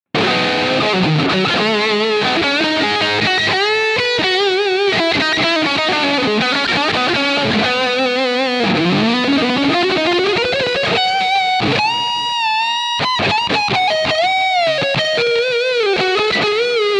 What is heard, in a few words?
Sterling Majesty X Neck Humbucker Lead